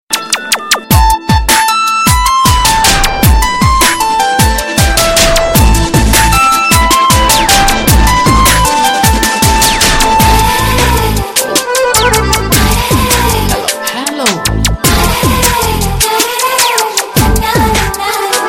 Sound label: 4G Music Ringtone; Airtel